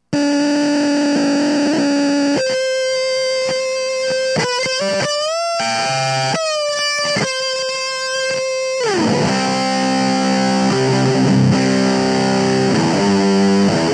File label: fuzz